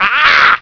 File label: hit; DKR64